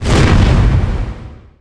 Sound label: Fireball Flame Burst; Weapons